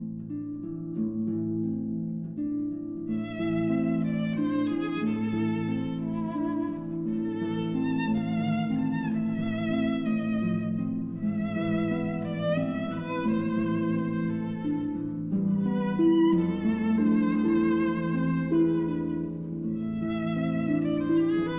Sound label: Berceuse, for violin and harp